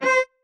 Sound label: marimba; ゆめ2っき